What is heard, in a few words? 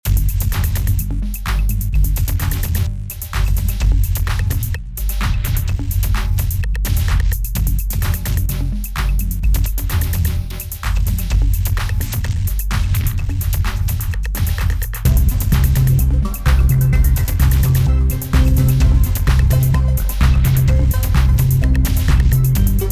chill electro